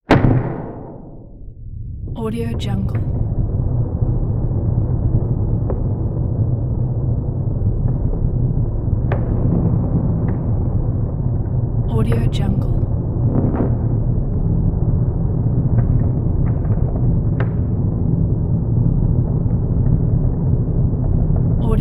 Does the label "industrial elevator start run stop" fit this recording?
Yes